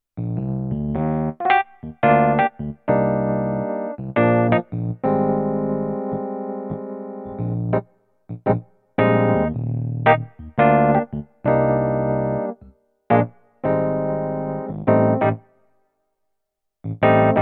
Roland FP-30X E-Piano

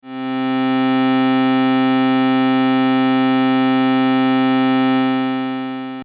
Saw Square

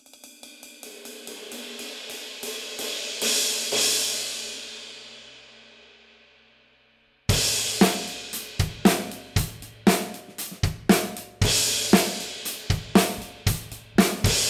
20 Traditional Extra Thin Hammered Crash

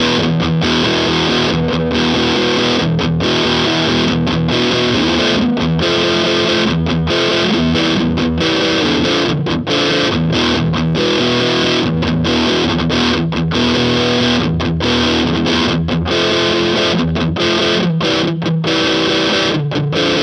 ESP Active Mode Both Pickups; Ben Weinman

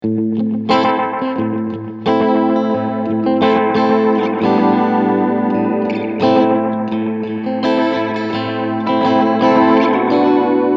Short Delay

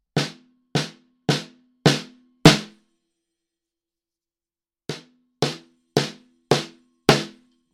Magic Pre Snare e606; Fredenstein

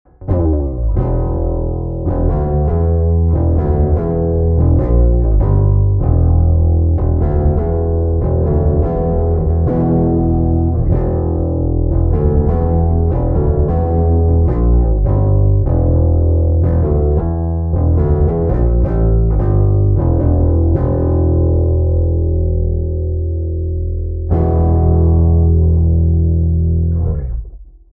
Doom EQ PostComp Enh Drive

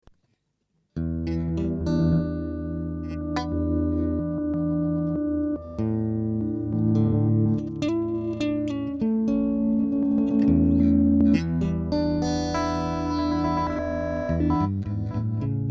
Glitch A guit Dimension

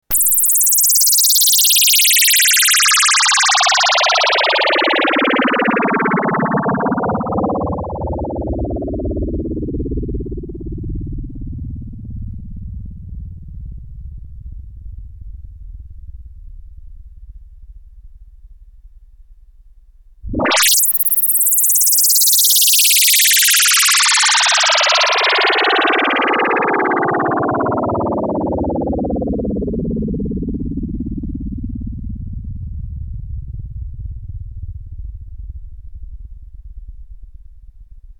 Polysix

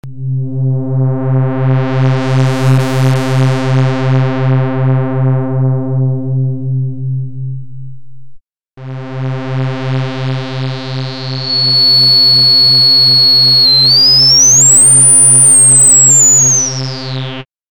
filter; mimimogue

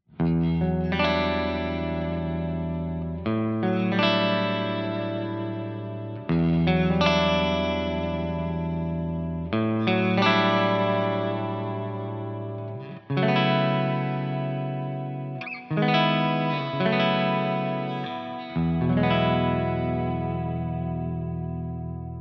MUSIC MAN L3 clean 3rd position

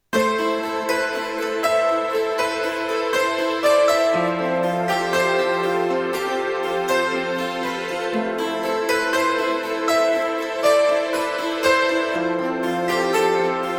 Fantasy Strings

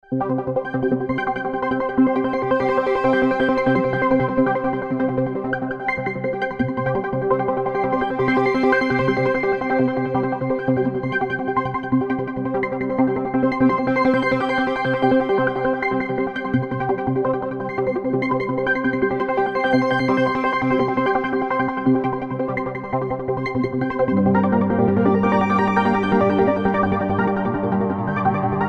Arpeggiator

